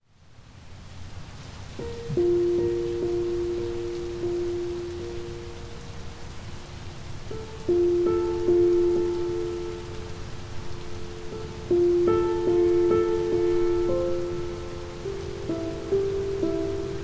Waterfall (With Rain), Calming Piano Music with Rain